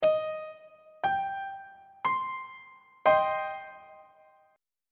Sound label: b sharp minor 1st inversion on treble clef